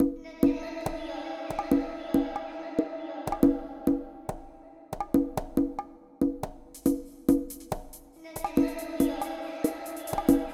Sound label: DRUM